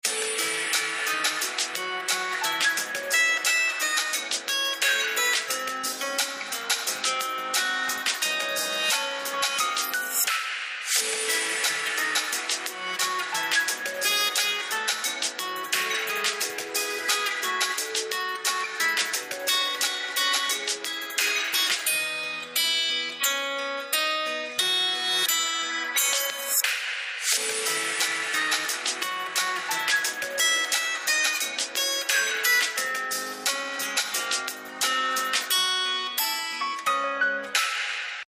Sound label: Duet